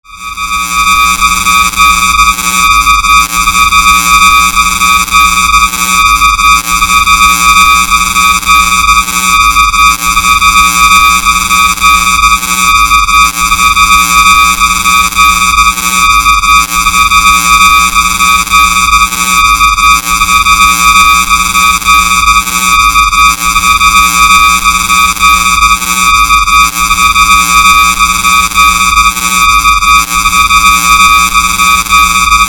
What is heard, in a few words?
Bed Distortion High Frequency Warble; Bed Sound Effects